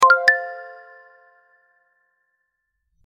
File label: notification